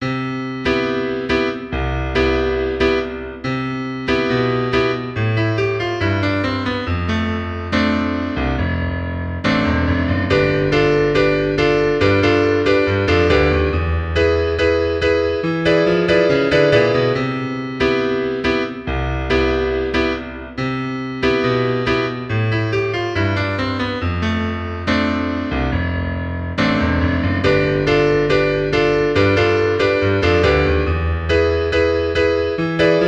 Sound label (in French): blues du vendredi, piano